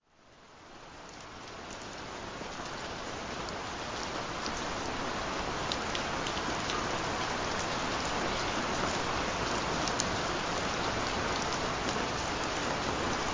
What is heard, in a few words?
Subliminal Pause 432 Hz Relaxing Rain Sounds